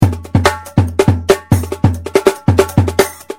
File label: jungle